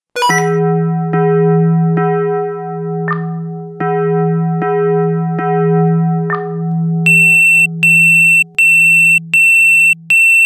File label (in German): SMS Alarmierungstone Dezent Ansteigend